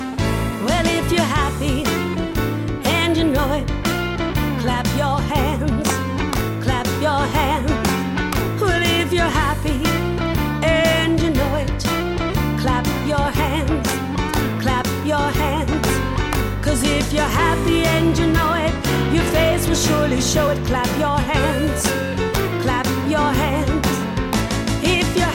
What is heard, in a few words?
If You're Happy Bluesy Version